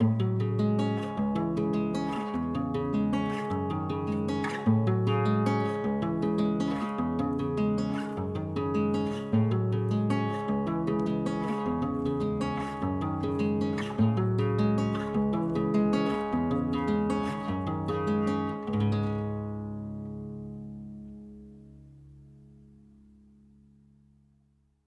Acoustic Guitar Folk Metal Vibe 103 Bpm C Sharp Minor; Castles